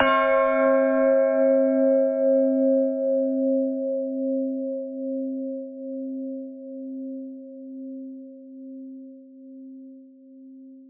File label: start